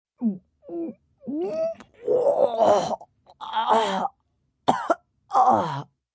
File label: Vomit Coughing, Vomitting, female, coughing; human voice; Vomiting; VoiceBox